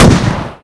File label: auto, weapon